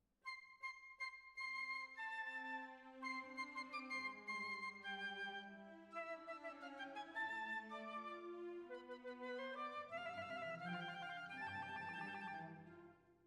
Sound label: Clarinet